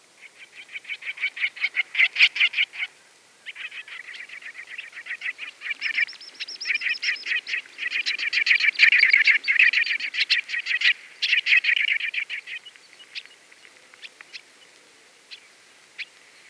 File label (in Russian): peschanka golos